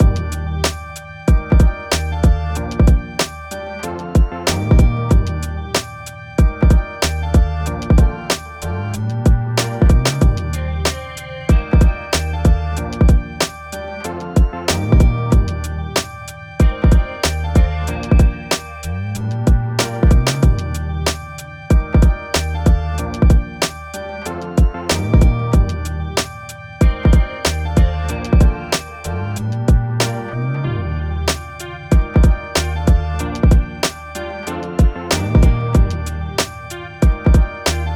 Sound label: Eb minor 94bpm